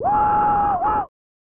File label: "Wooooooo-ooo!"; Hit The Ice; SNES